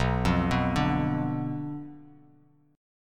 B Diminished B down Bass Standard; Bdim arpeggiated

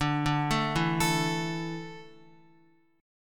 D Suspended 2nd D, down, Guitar; arpeggiated

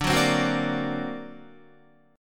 D Augmented 7th D down Guitar; D+7 strummed

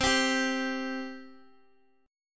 C 5th C down Guitar; C5 strummed

